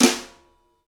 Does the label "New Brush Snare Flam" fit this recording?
Yes